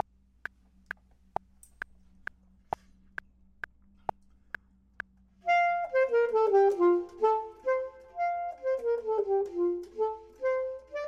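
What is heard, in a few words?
top, Saxophone and Clarinet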